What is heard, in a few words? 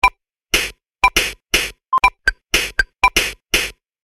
Slingshot Rhythm Core; The Lab; valve